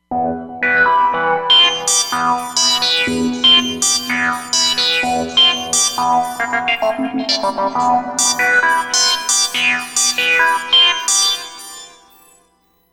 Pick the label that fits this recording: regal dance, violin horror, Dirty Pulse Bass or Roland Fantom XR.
Roland Fantom XR